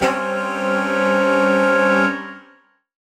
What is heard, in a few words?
Horn Swell Alt Gminb5; undercover samples